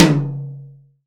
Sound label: high tom one shot c sharp key